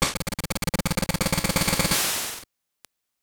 Jingle, gbc

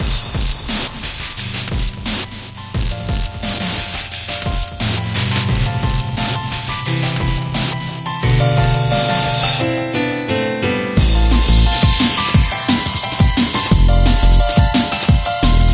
Piano Dnb; Danmaku Death; android

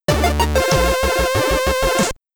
Get Ready!, SID Stereo, commodore 64; Eskimo Games

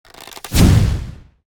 Magic Arrow; Ranger

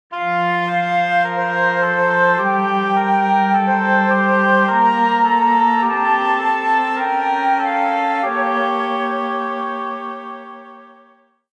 Industrial Calm; xbox; Civilization Revolution

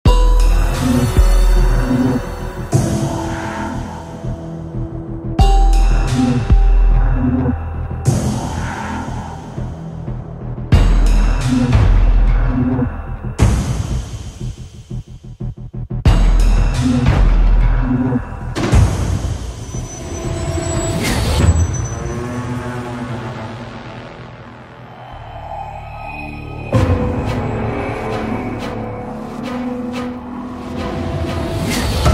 Boss Battle Suspense; Hello Neighbor 2

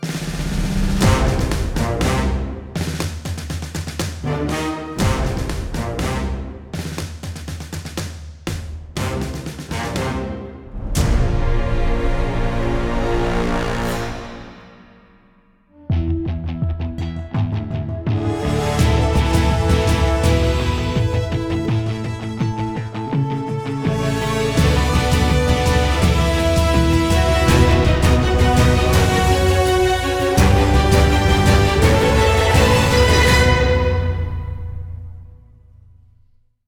Music Closing Cinematic; bakugan champions of vestroia 2020; switch gamerip

